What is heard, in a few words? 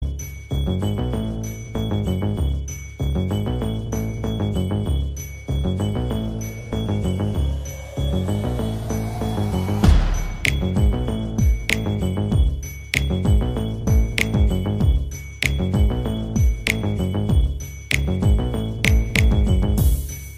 Knock